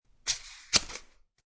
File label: Quick Paper Cut, Quick scissor cut.; Offices; Scissors